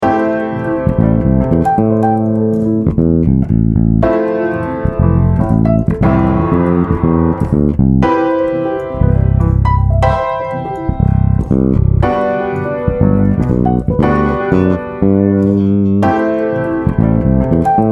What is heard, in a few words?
Aminor, bass; chord substitutions; a bassists guide to chord substitutions